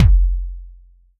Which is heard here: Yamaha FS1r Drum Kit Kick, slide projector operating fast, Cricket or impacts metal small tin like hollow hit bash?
Yamaha FS1r Drum Kit Kick